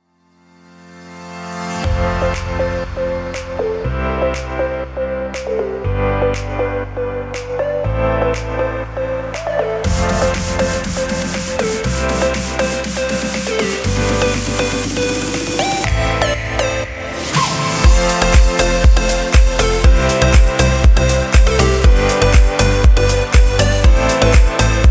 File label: Summer Uplifting Pop; rockpop